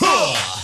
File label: "huh", voice